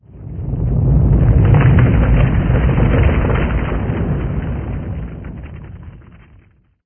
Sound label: quake